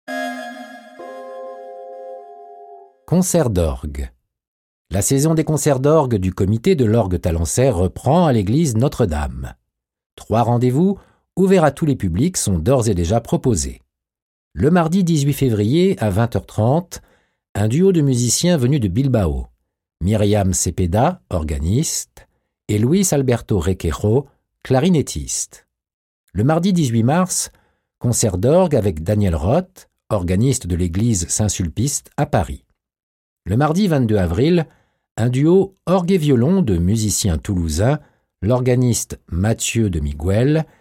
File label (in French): concerts d'orgue; talence